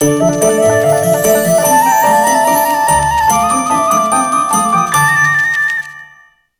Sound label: Game Win; lernerfolg vorschule prinzessin lillifee; nds